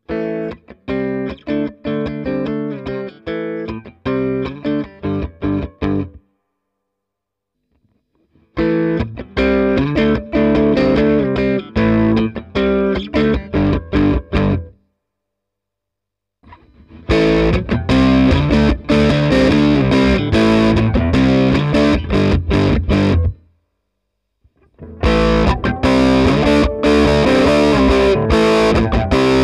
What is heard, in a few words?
Drive